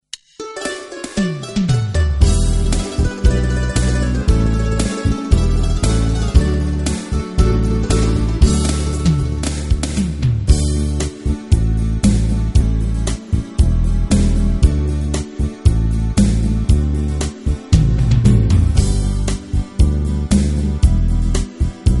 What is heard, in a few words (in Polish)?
matko milosierdzia instr